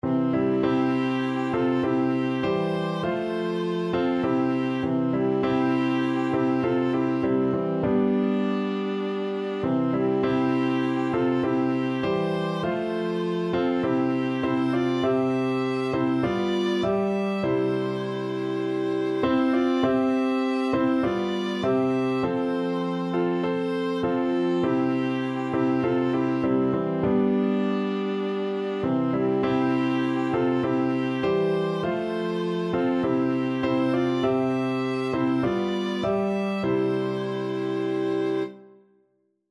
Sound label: there is a fountain, flexible ensemble and piano 4 players and piano